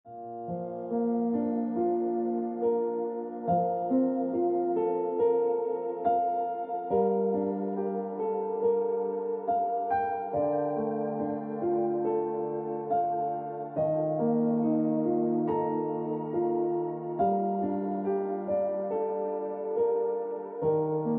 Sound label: Tonic Note Piano Music